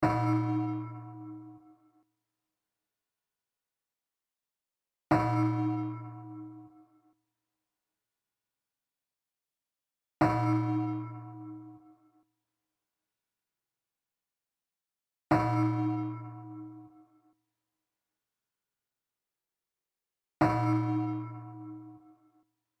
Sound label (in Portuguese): amostra de pulsos com periodicidade